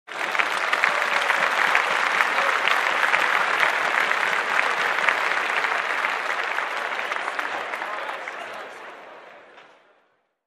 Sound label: Introduction Applause